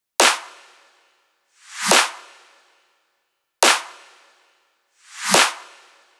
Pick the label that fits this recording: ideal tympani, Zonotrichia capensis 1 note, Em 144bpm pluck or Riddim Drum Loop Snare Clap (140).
Riddim Drum Loop Snare Clap (140)